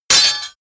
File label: sword parry metal